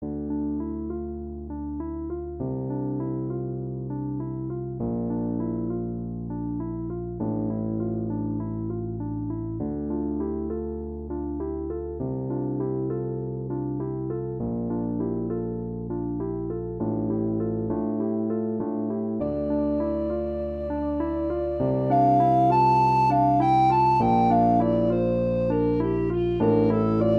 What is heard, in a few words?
Musings for Treble Recorder Piano; excerpt; Treble Recorder and Piano